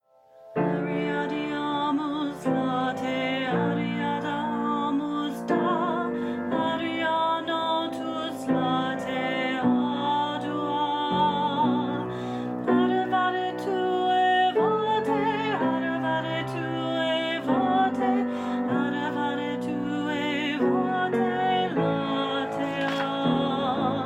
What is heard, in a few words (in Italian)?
melodia principale